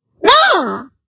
Tail poke Sfx

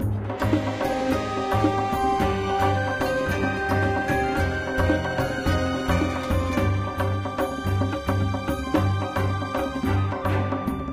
Restaurant; Diner Dash Once Upon a Diner

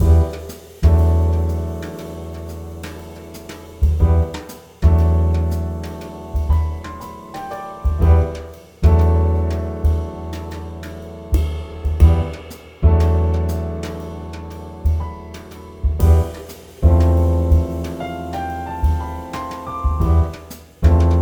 Contemplate

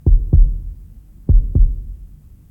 Coprs HeartBeat Lent; Puddle

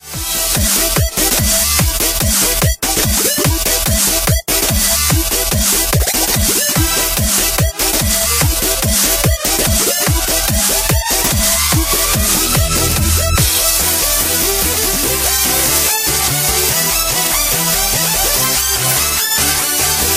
EndLevel; dashy square

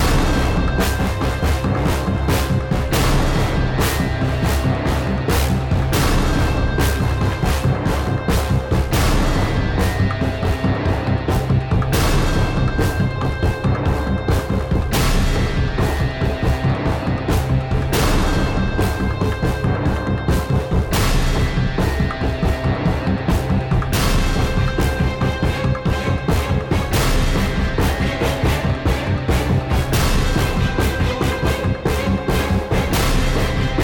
Arena Boss Loop; Infinity Blade 3

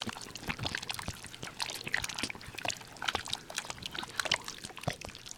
Wall stick Loop Gosma

